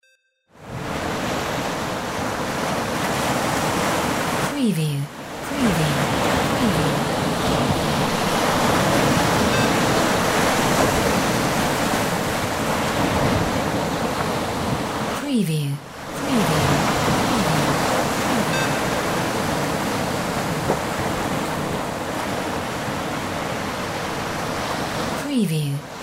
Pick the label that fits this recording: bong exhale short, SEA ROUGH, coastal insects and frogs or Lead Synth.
SEA ROUGH